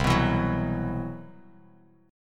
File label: C Major Sharp 11th, Bass Standard; CM#11 strummed; JGuitar